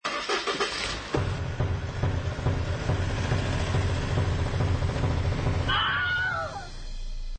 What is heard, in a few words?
Sound Classic